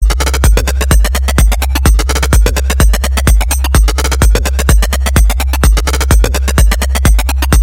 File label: Sms Electro